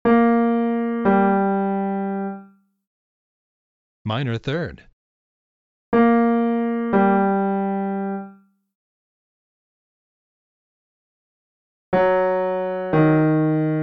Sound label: m3 M3 melodic; Interval Recognition 1; Training Exercise